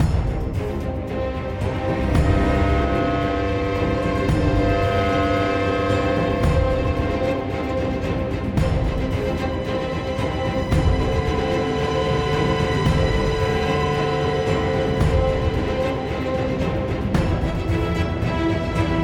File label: End Battle; There Is No Game: Wrong Dimension